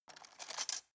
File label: cutlery plastic drawer grab